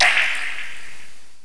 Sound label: water drop